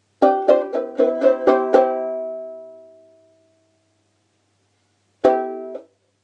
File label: banjolele